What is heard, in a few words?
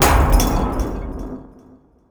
boom; Logi; Loupedeck Sound Pack